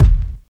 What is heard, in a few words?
steel kick drum e key, Royality free bass drum single hit tuned to the E note. Loudest frequency: 134H; kick drum samples